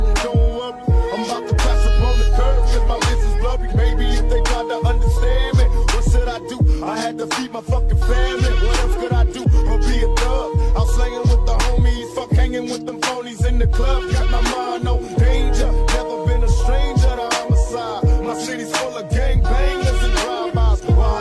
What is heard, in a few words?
HipHop